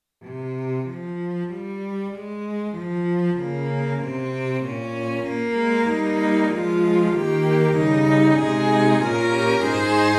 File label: Fl Fl Eh Fg Str, Tonality：f (♭♭♭♭) Tempo：Quarter note = 96